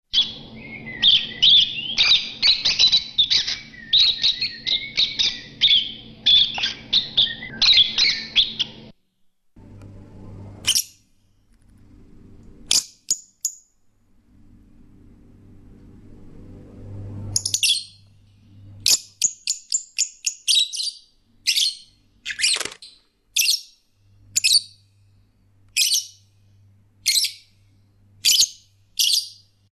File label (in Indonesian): suara call lovebird